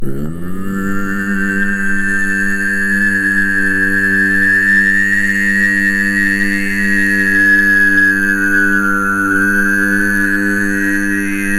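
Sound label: Mouth Chant Reverse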